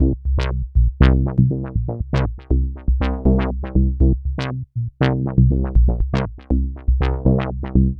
Bassline; Sonic Foundry; Prototechno

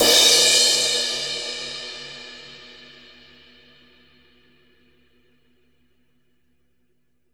CRASH

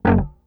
EFX SHRT F#1, bass; Sampleheads; Ultra Freakin' Bass; EFXBASSSHORT

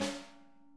SNARES, EDGE, Drum Kits

